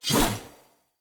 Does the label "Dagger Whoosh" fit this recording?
Yes